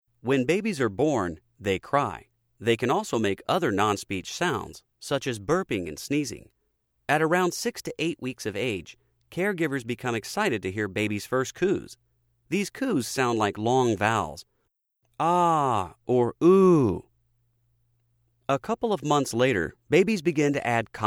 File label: Vocal Play